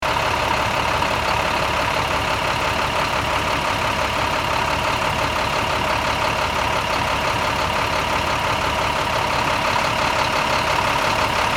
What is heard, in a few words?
Bulldozer engine idle loop